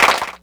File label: Clapbox Pitch 12; Ableton Foundation FreePacks